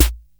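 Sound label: KR33 snare drum; Crypton - DrumBase5000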